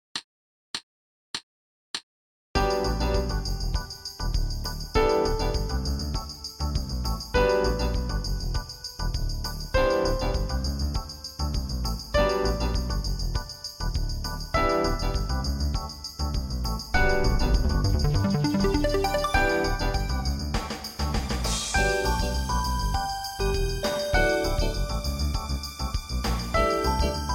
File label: G Concert Whole Notes